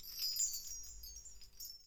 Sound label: BELLTREE